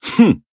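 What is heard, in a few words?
Voice attack, Japanese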